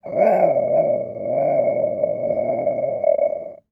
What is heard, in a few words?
Animal Mammal Carnivore Cat Bobcat Growl; animals; AdobeCC